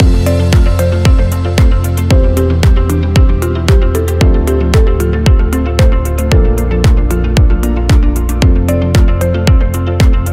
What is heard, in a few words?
Upbeat Loop